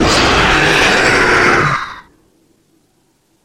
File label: classic jumpscare sound; five nights at fangs